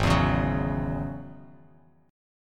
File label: Ab 7th Suspended 4th Sharp 5th down Bass Standard; Ab7sus4#5; strummed; chordshape; JGuitar